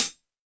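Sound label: Hip-Hop Kit hi-hat; Roland Juno-D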